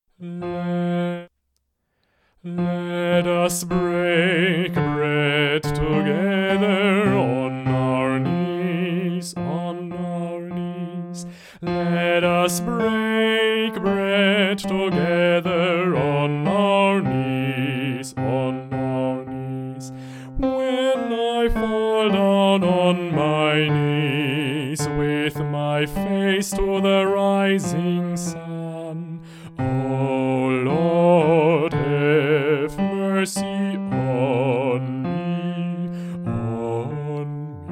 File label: Let Us Break Bread Together Bass allein